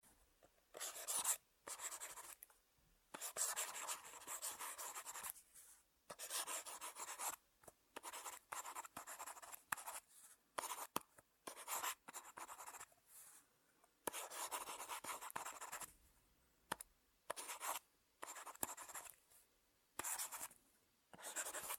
Drawing Tablet Pen Writing

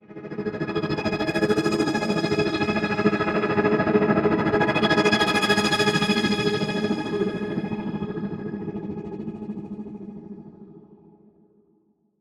Scattered Choir Effect

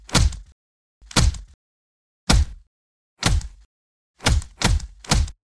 Boxing Punch; Custom Tmnt 2012

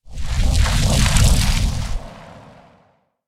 Spinning Comet Whoosh

